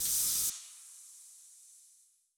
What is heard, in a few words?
mega shipyard electricity amb; Stellaris; federations